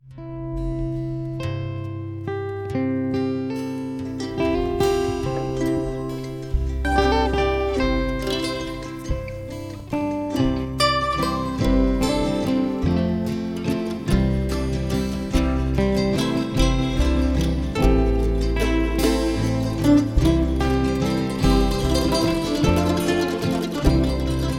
Instrumental

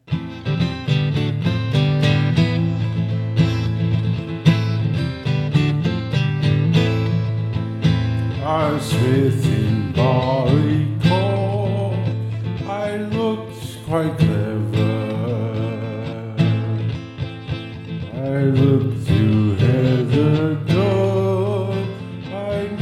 Jam

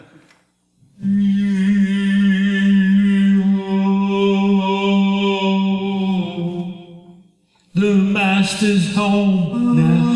Chant And Stuff

